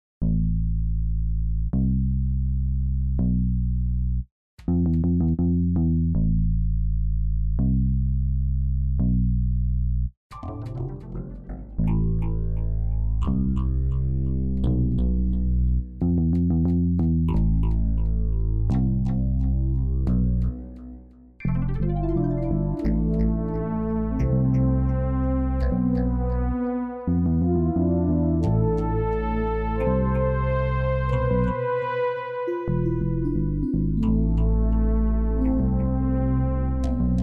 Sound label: Wind and water